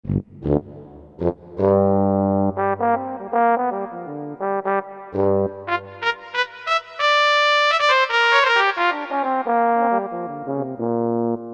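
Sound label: Tuba Trombone Trumpet, Korg MSC-11S Brass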